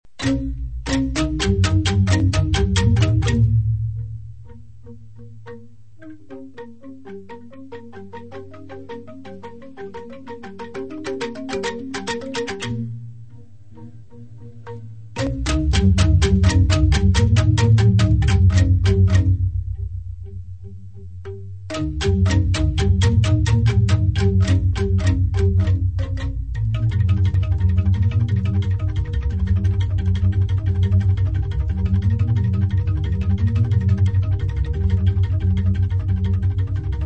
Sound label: jegog